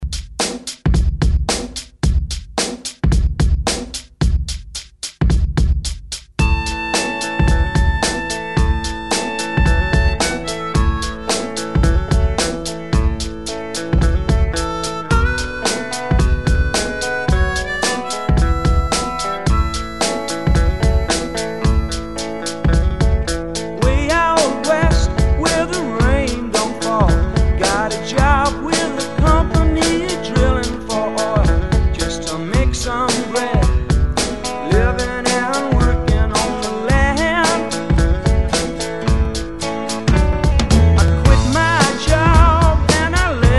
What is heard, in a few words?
110Bpm; DJDOLLS; ReDrum